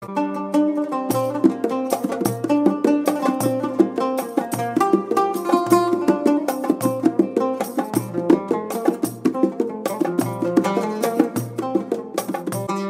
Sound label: Arabic Ringtone